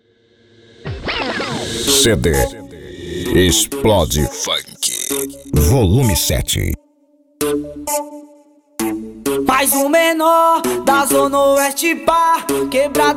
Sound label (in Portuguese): explode funk